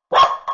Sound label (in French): nature aboiement